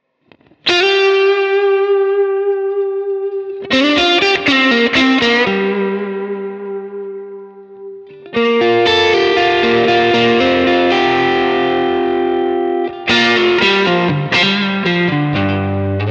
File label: Reverb